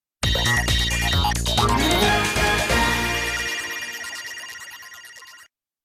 Tema de victoria; Game & Watch; SSBB

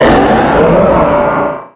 Grito de Mega-Camerupt

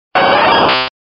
Grito de Flareon; Pokémon

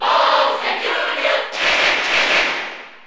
Grito del público (Español NTSC); Bowser Jr.; SSBU

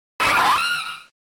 Grito de Prinplup

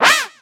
Grito de Tepig

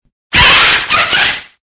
Grito de Sizzlipede; Gritos de Pokémon de la octava generación

